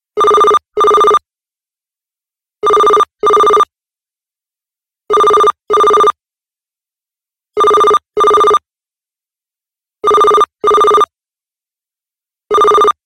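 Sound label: standard ringtone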